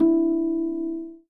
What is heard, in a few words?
harp; String